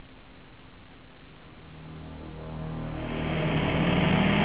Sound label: solo, Gospel; guittar